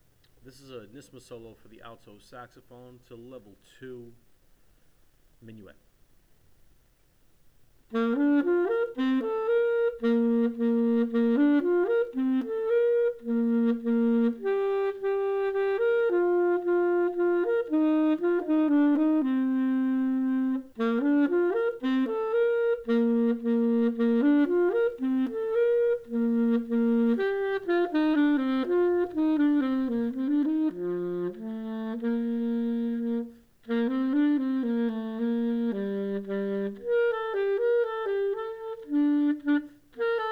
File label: Alto Sax solo Minuet; NYSSMA